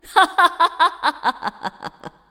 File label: laugh; Katarina